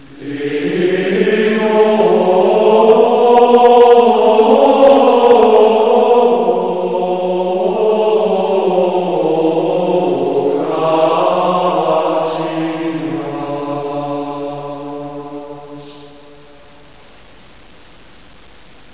MONKS

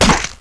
knife hit

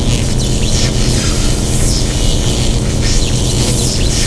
beam

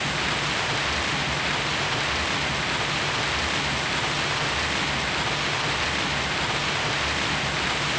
Waterfall; de waterfalls

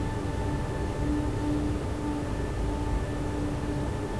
industry

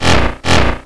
pit drone hunt; svencoop; sectore

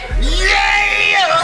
yeeeeaaaaahh

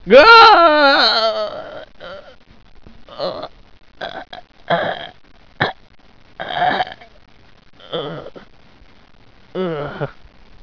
scientist scream

